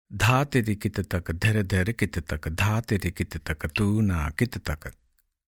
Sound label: Dha tirakitataka Dheradherakitataka, tabla